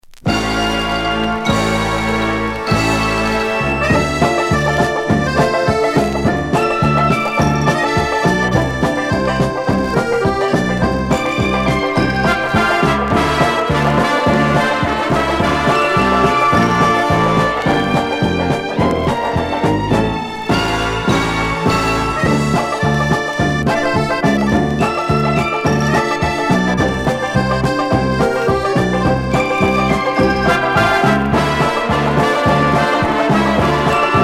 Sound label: instrumental version